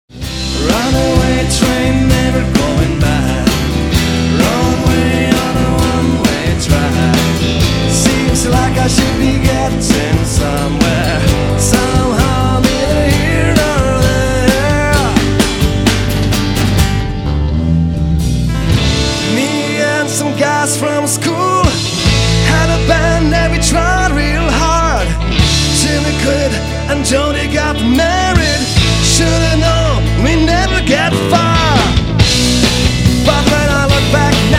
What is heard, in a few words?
live medley